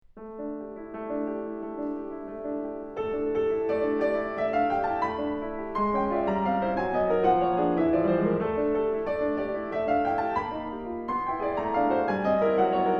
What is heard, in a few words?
Solo Piano, Finale